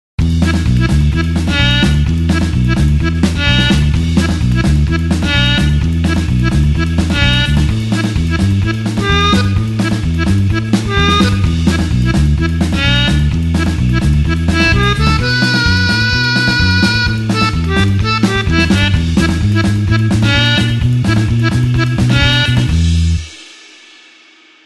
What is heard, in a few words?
blues harp 8beat E